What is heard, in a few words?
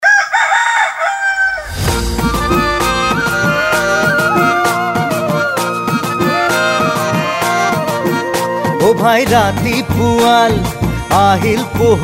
jingle assamese